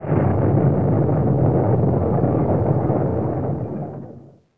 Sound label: THUNDER